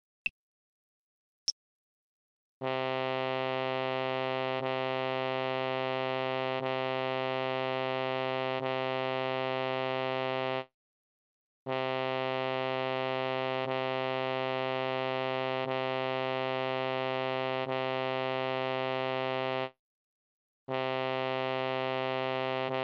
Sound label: c trumpet